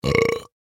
burp male

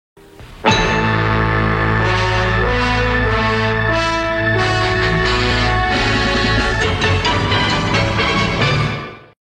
logo; revue

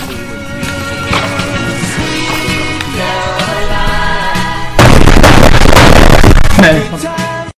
sweet caroline desk slam; xqc

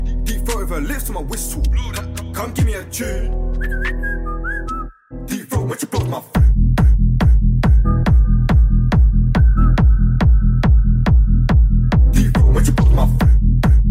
whistle

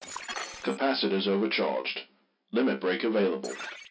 crit success

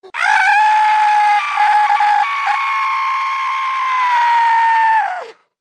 chicken scream

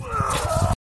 uahh

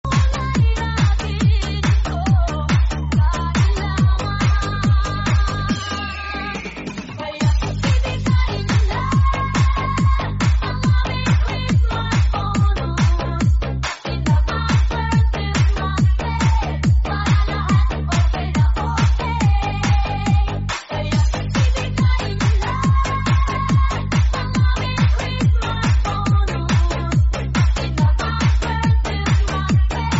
outro xmas

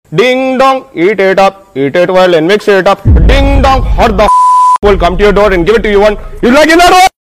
ding dong clean

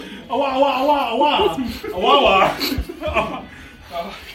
auaua

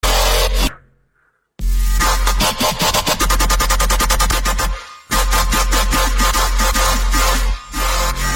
dubstep, music

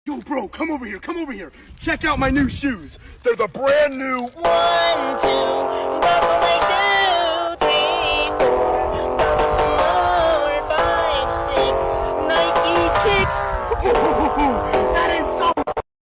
one two buckle my shoe phonk

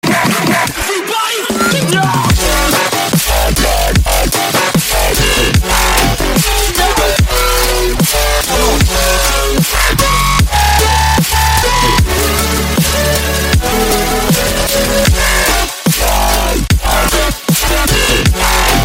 launchpad pro cover; nanomake

